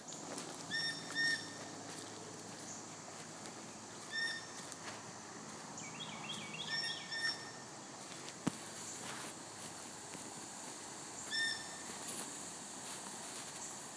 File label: bird call